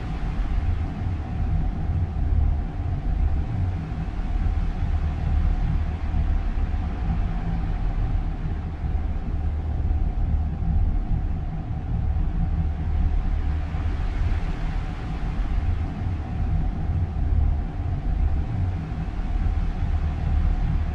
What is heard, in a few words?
ship colony ship idle; Stellaris; machineage; ships